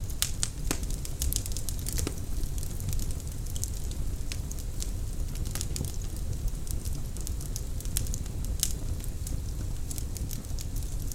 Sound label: Torch Loop, torches